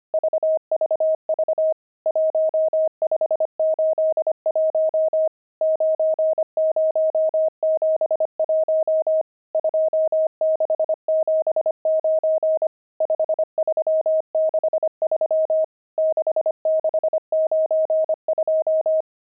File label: pangram, intermediate pangrams files